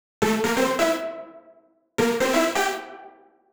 136bpm Lines n Loops Synths, uk garage samples

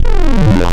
Flange Square, wavetables; Animoog

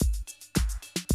110, Classic Drum Loops

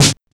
Snare, drums; Sonic Foundry (Sony Creative Software); Drums & Drones; Construction Kits; Squeeze Kit

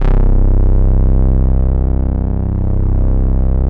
STRINGZY C0; Strictly Synthe3; E-MU Producer Series Vol. 6 – Analogia Project

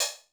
Dance Kit, Korg 01W

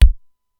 SDS9 BD C2, Simmons SDS-9

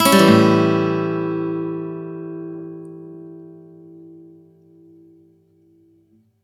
One Shot A upstroke, Acoustic Guitar; Sonic Foundry (Sony Creative Software); Songwriter Acoustic Guitar Companion

